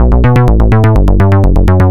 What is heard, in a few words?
Disco Fever; LICK; Best Service ProSamples vol.48 - Disco Fever; AKAI